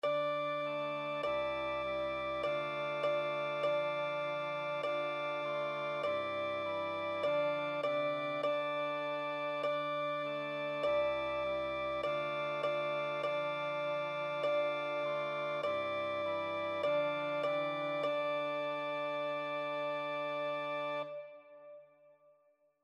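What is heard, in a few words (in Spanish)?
Guitarra; Dioses del Olimpo; 5º Antiguas Culturas, 5º Historia y Narraciones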